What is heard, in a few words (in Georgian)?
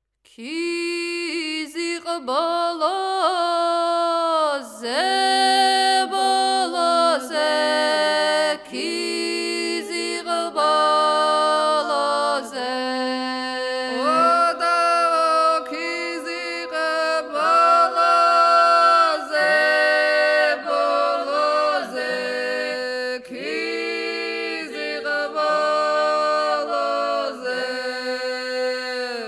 ქიზიყ ბოლოზე მოხეური მეორე ხმა; გალობის უნივერსიტეტი; სასწავლო